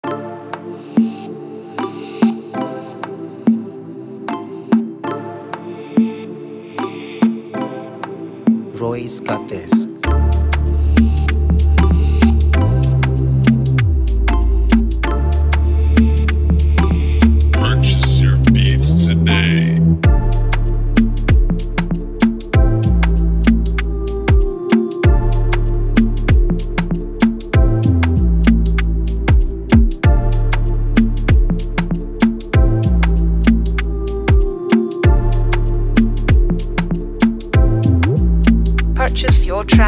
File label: Type Afrobeat Free Beat